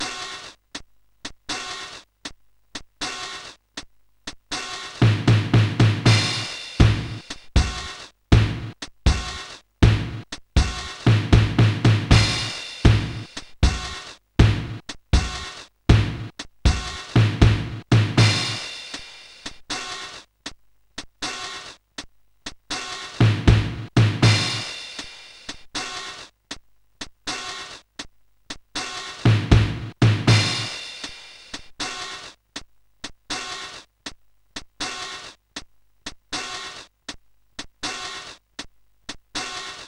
Swing, Sonic Drum Kit

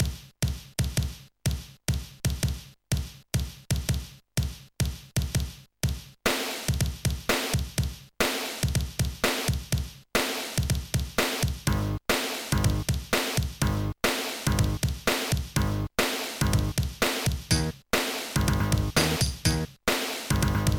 Rock, Dynamic Drums

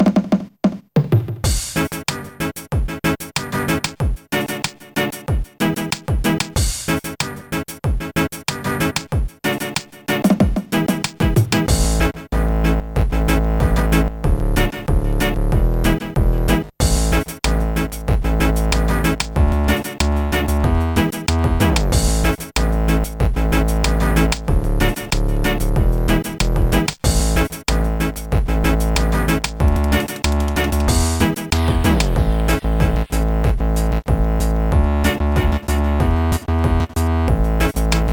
reggae dub; Trackers Modland

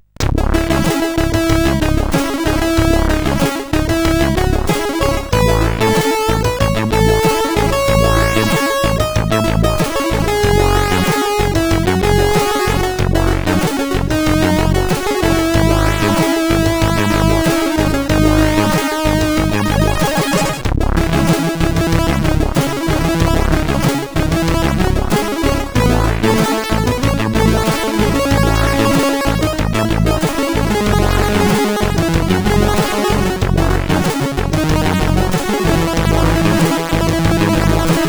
Intro, CSG8580R5; Pleite Geier

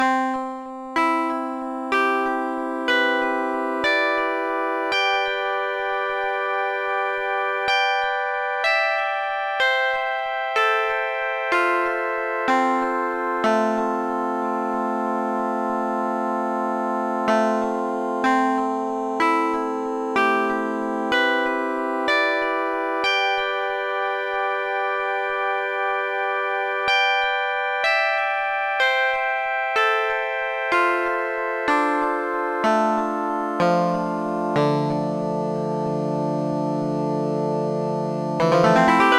scales; MusicLine

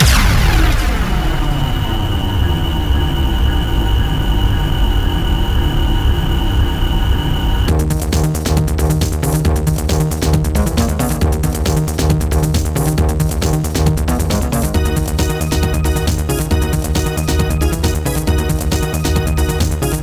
mod weird fuzz; KEFRENS

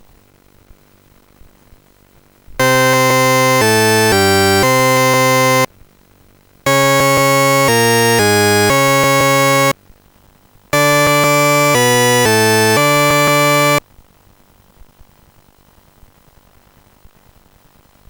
tune; Sonix Music Driver

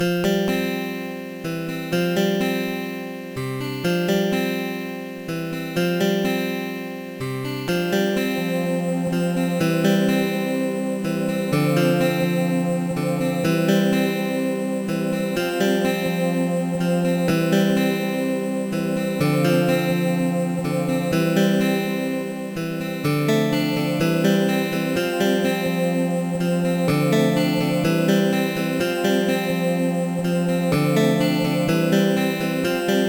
acoustic movements; LSD

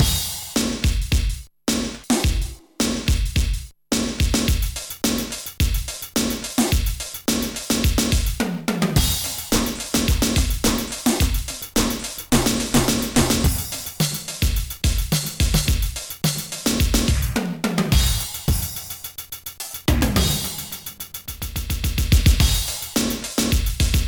drum'o matic; Trackers; Modland

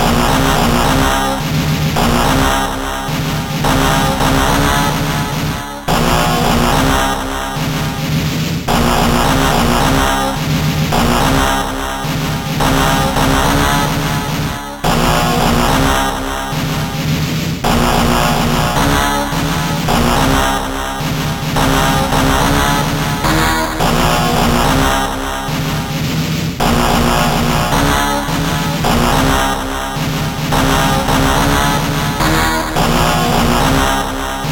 weapons tune, Trackers; Modland; Rogue Male

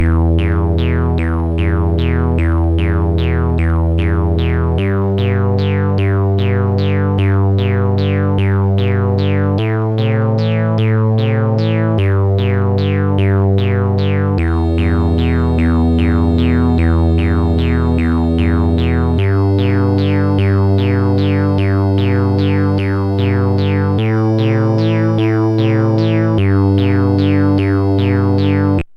deep sea blues; MusicLine

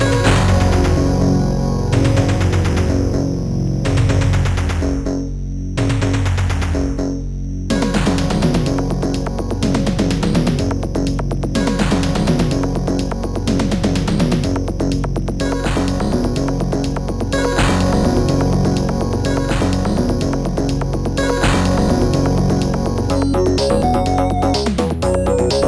menu; tie break; SoundFX 1.3; Ronald Saake

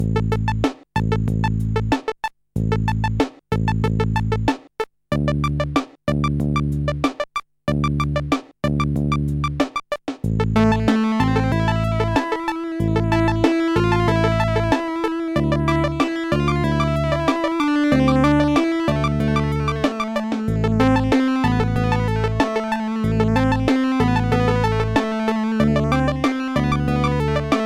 digital groove; MusicLine

